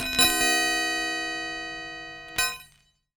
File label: Baoding Balls